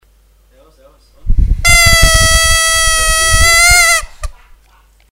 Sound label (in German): Pelikan laut